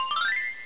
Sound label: get item; painters